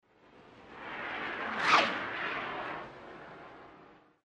Fast Blow Torch By With High Pitched Whistle On By